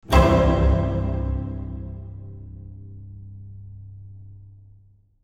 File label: pure space orchestral cadence